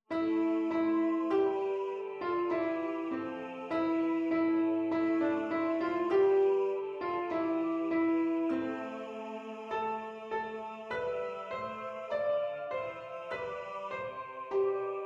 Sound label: Alto Piano; En Gardant ma bergerie